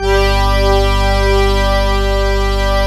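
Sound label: pipe G2, organ; EGLISEORG; POPPIPE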